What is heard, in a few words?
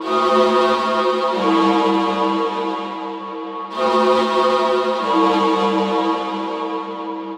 Pad Loop 130 A, Techno; Loops; Pads; GhostHack; Ghosthack - Free Techno Sounds 2024